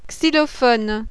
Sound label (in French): XYLOPHONE, PERCUSSION; musique